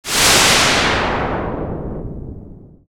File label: fx down crash